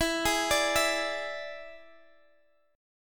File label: E 7th E, guitar